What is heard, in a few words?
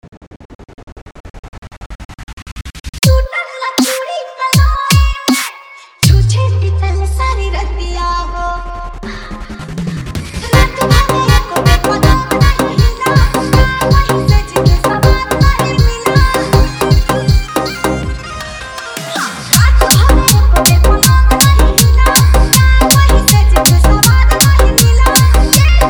Bhojpuri Dj Remix; Dj Deepu Raj Gorakhpur